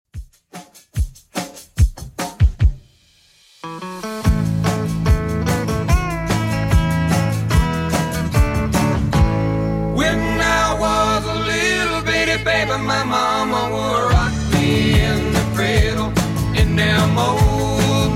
60s Redrum, 146 bpm

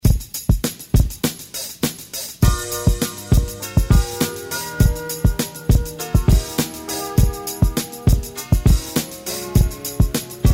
70s redrum

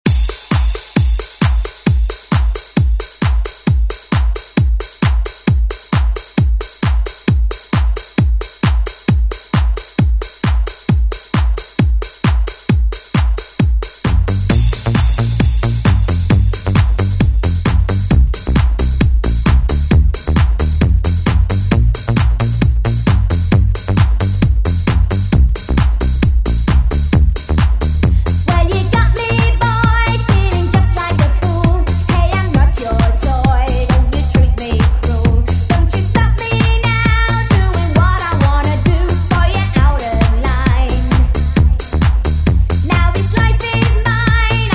90s ReDrum; DJ Mhark

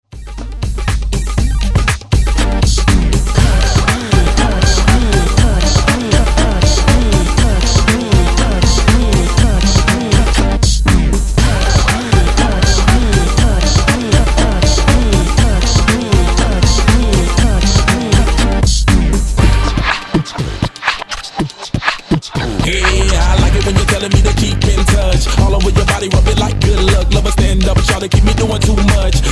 Extended Edit 120Bpm

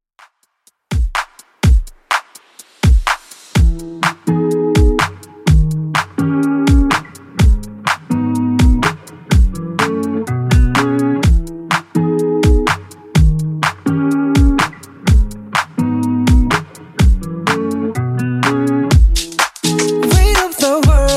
Top40 Redrum 125bpm

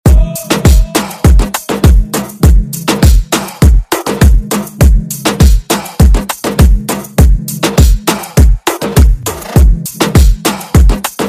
Moombahton Redrum

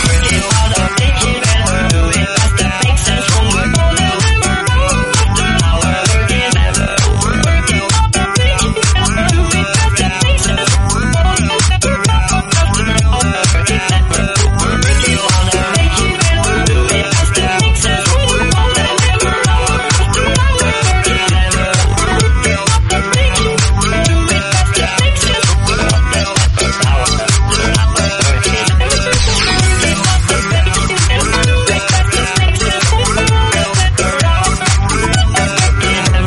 130bpm Bm

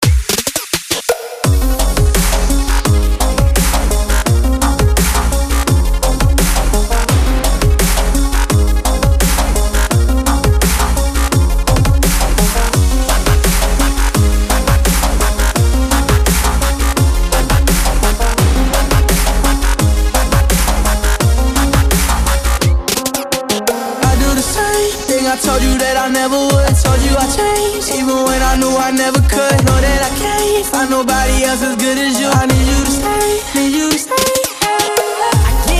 dubstep breaker 85 bpm

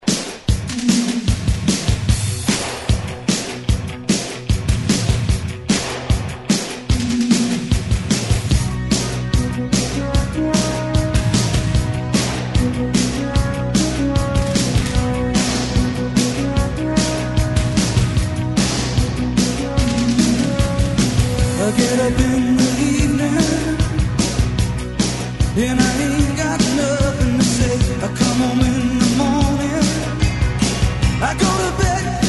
Extended ReDrum 150 Bpm; DJDOLLS

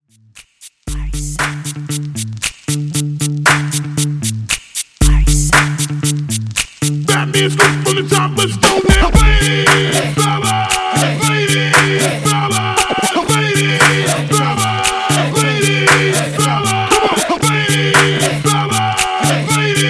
Hype Intros 116 Bpm

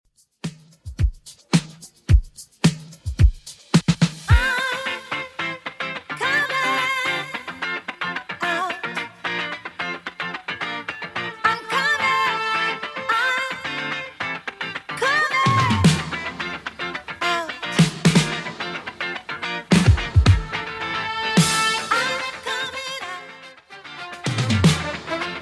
80s Redrum 109bpm

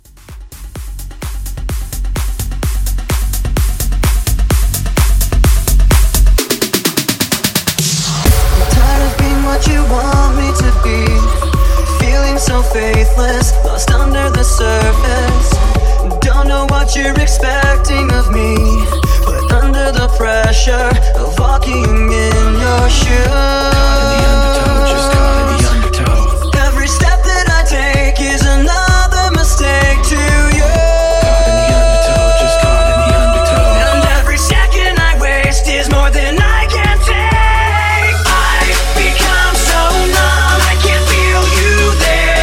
Festival 128

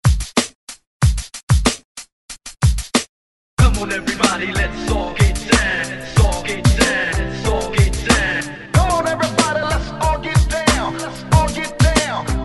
80s Hype ReDrum 93 Bpm; DJDOLLS

